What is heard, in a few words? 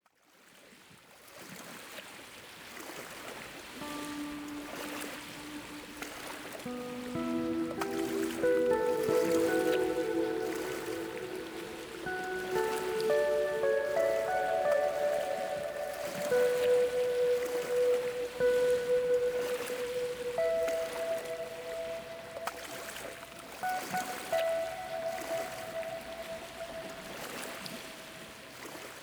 Yoga Meditation Music Set Nature Sound Relaxing Ocean Waves